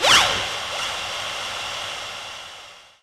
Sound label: Zipper; Zero-G; Soundclash Nu Dance Electro; Acidized Wavs; FX Atmospheres